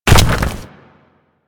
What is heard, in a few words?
Colossus Hand E; Warframe; Colossus Boss